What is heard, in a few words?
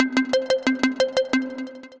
Bright Plonks, Synth Rhythmic; Ableton; Glitch and Wash